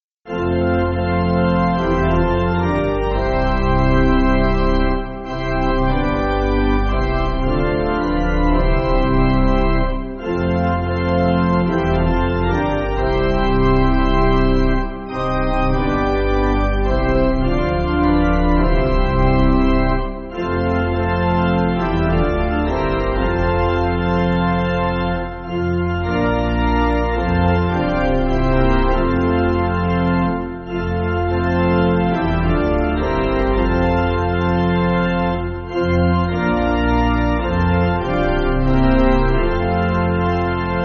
7th Mode Melody, Pipe Organ

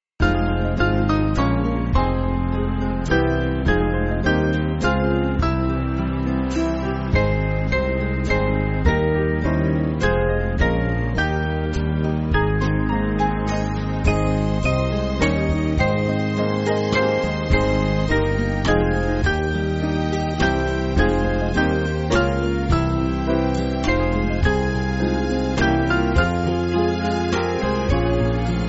Band, Backing Files for Churches; O Heavenly Word; Uffingham

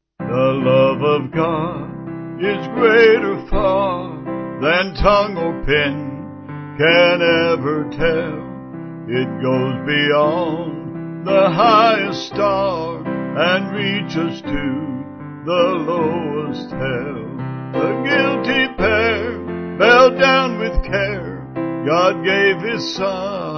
vocal piano; The Love Of God

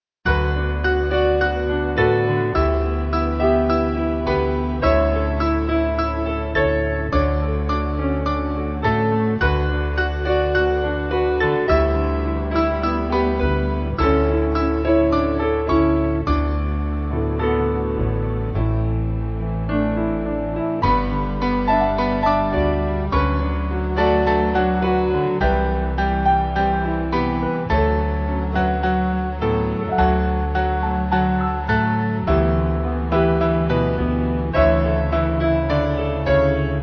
Band

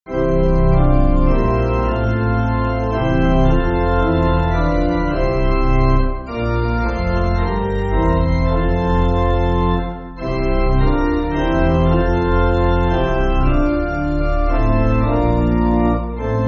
Pipe Organ, Backing Files for Churches; The Saviour Came; Franck

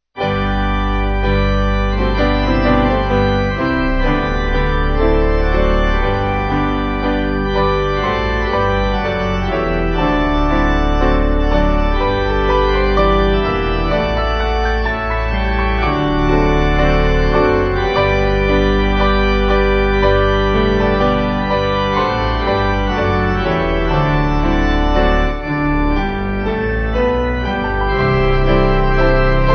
Piano Organ; Lyngham

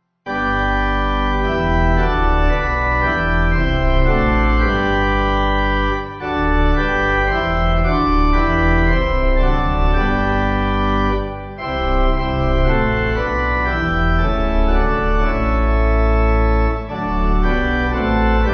Pipe

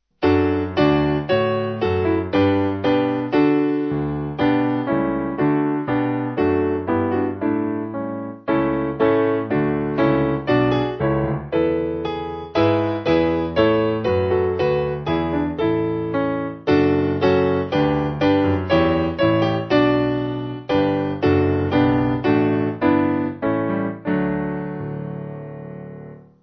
Piano; God Of Mercy; Heathlands

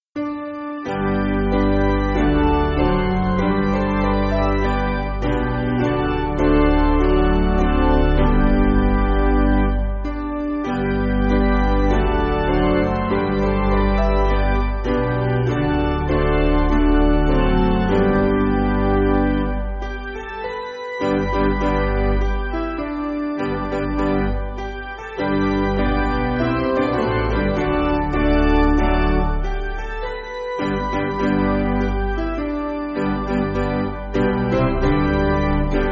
Hymnary Piano; Basic Piano & Organ (CM)

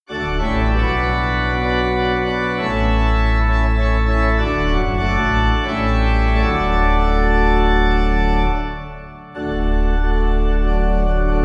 O Could I Speak The, Ariel, Pipe

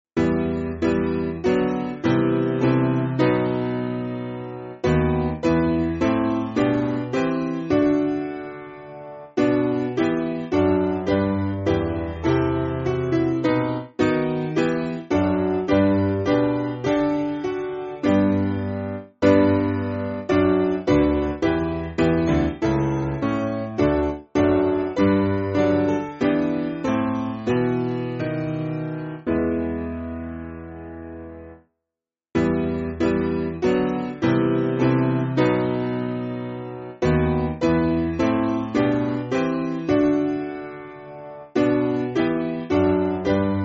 Piano, church music

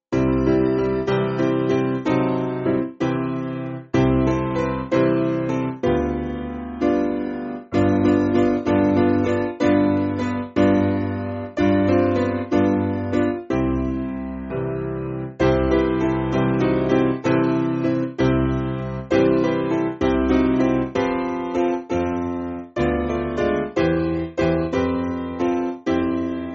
Piano, church music; Jesus Is Coming To Earth; Morris

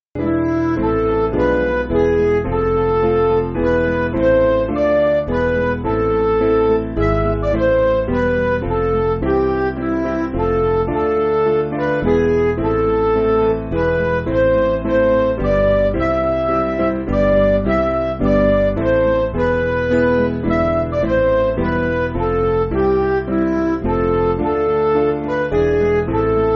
Midi; O Jesus My Hope; Old German

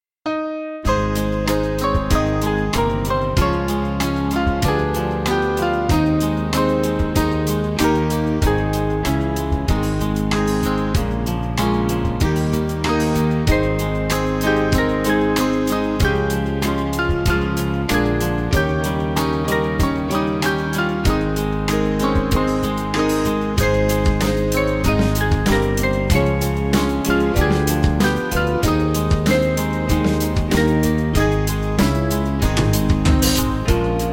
If I Have Wounded, Band

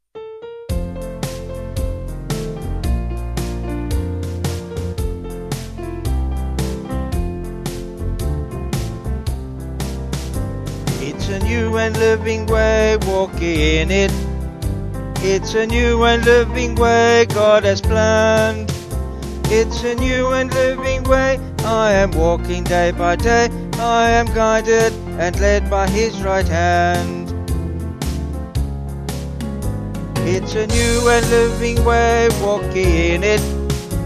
Vocals Band

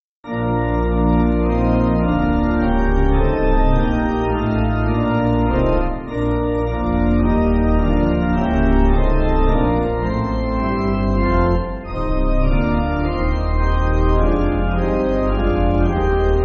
Pipe, church music

